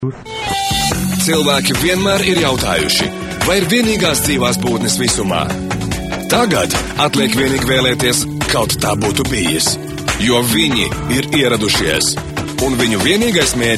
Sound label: latvia jingle